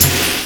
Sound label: 202 DERI CR, CYMBALS, Industrial Loops; USB Soundscan vol.24; AKAI